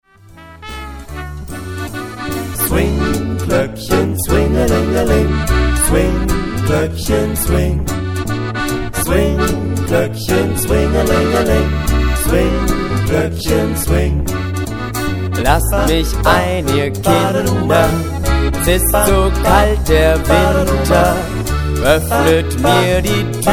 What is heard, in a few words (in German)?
Swing Gloeckchen